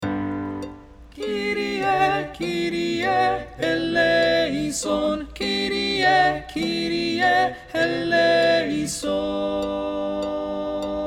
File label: contralto; Kyrie